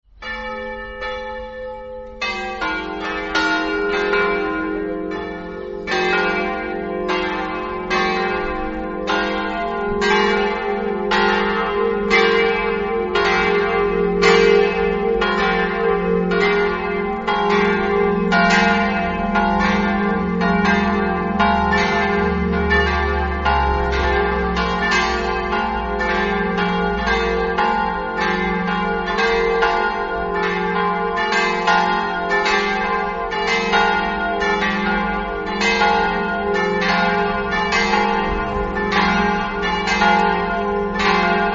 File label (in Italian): campane; s marco